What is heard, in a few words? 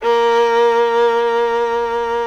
FIDDLE NOTES, A#3, R